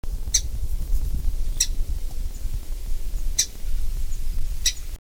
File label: Zonotrichia capensis chip